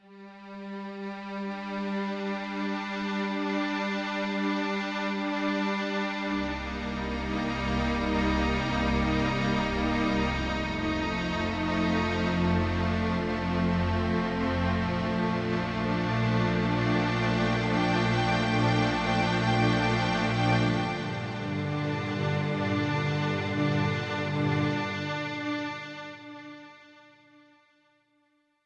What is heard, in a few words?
Soundtrack, Yamaha TX16W Sampled Strings